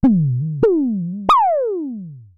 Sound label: Synare Filter; Star Instruments Synare 3